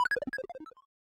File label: Boss Core Hurt Stinger; Avie